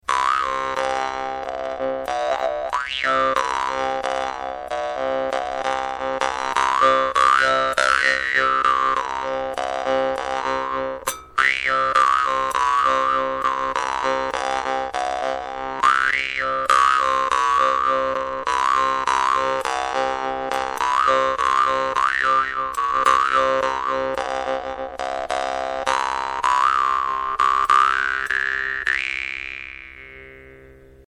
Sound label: doromb, vargan; hungry doromb new siberia